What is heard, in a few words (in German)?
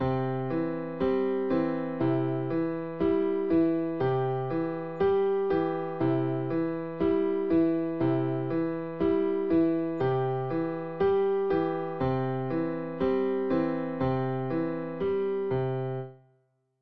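Rhythmik Übung, klavier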